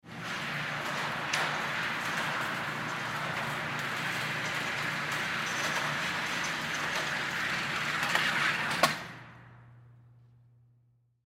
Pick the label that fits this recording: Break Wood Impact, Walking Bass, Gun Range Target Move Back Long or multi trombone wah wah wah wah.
Gun Range Target Move Back Long